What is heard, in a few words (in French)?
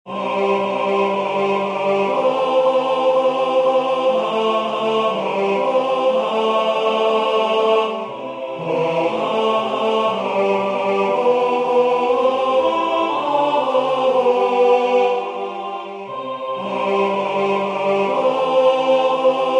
Tenor, chorale; Concert du 13 décembre 2024 en l'Église St Pierre - St Paul